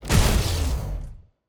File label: Orbital Hammer Get Hit; TANK; 3Souls